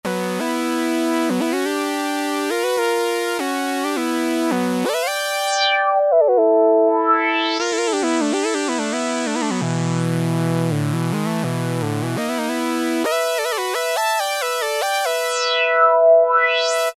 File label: Lead 5th, Alesis Micron; Factory Programs; Mini 5th